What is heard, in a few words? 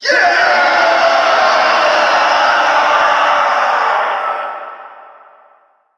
Crowd; Warframe; Rathuum